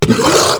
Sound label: Flying Mouth Pain; Enemies; Warframe; ManInTheWall